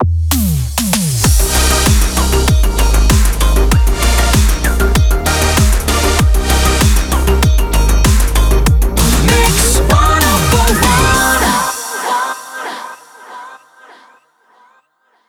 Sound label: 097bpm g Ramp